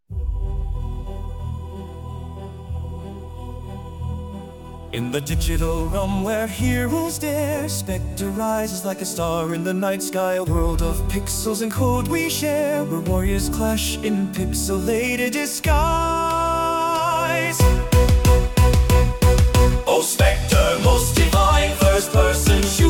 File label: Choral